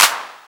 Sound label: Claps; Vengeance - Essential Clubsounds Vol.5